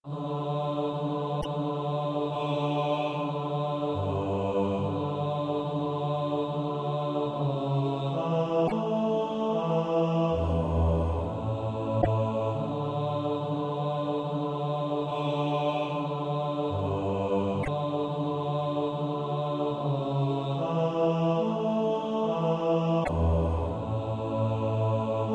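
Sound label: bass; Lord dismiss us with thy blessing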